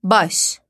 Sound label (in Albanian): bas